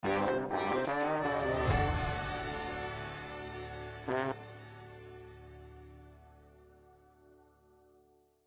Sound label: Chest Sell; Sea of Thieves; Gold Hoarders; Chest of a Thousand Grogs